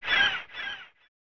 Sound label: Seagulls; Cannon Fodder; SNES